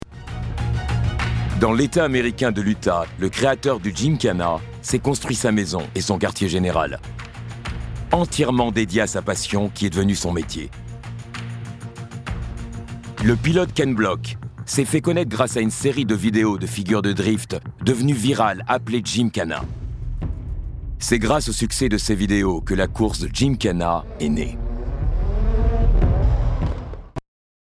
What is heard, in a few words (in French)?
Gymkhana Moteurs